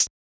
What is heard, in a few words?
Closed Hat; Closed Hats